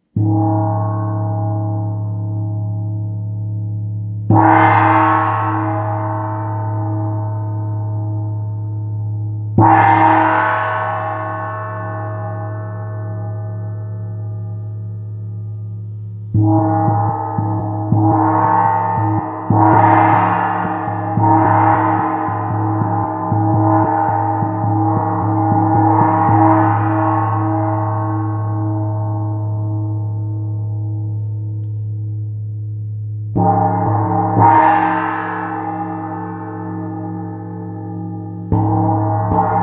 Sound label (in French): Son gong, gong 40 cm bord plie alliage de 7 metaux; gravure artisanale